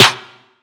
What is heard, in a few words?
SNARE